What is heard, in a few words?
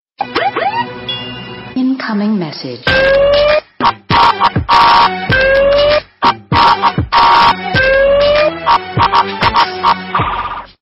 incoming msg siren